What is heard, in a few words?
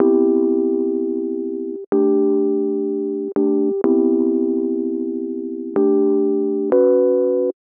125 Rhodes Dom A, Keys, Nu Jazz House; PowerFX - Nu Jazz House